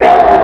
Kremling SFX

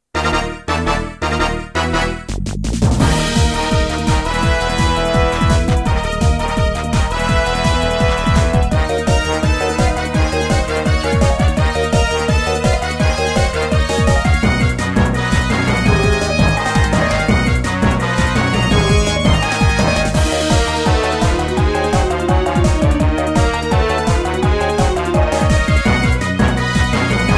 music

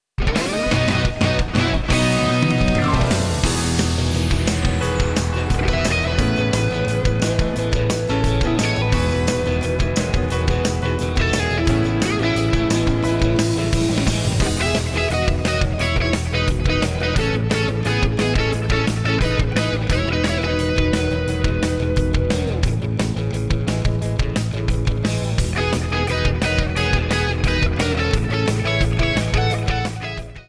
Rock Ver. Arrangement; MK64; Koopa Troopa Beach

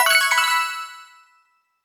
Coin; SMM2; SM3DW; 30-Coin

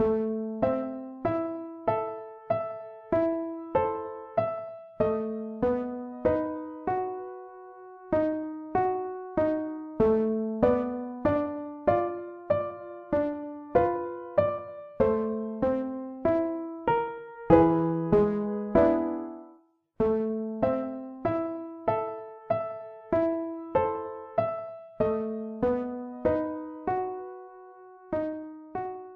Hallways Piano

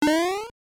Big Jump

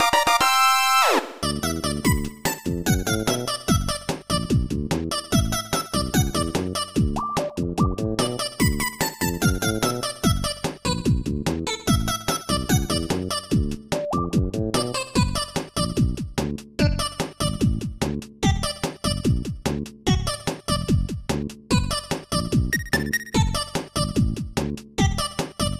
Win; Dr Mario

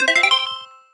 Stylish Move

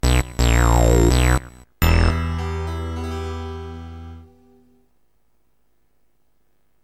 Game Over music

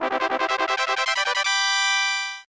Fanfare

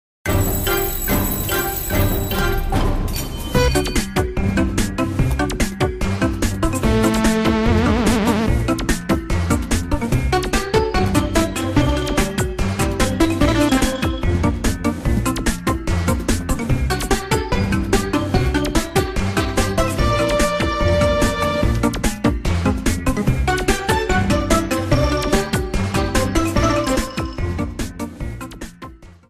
Tick Tock Clock; MK8; DS

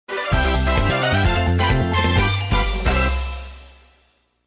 minigame victory; Mario Party 7

